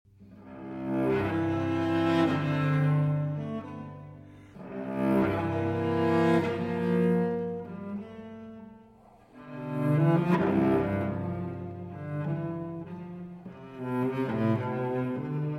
Unaccompanied Cello Suite No. 3 in C Major, Sarabande; BWV 1009